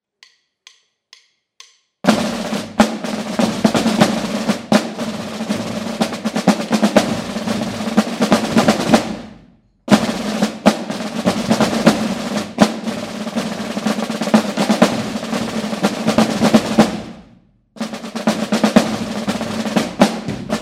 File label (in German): Tambouren